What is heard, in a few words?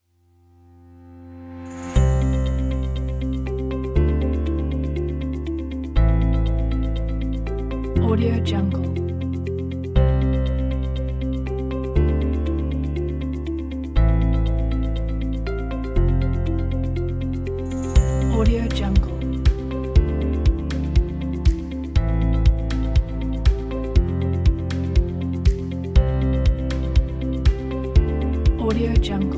positive pack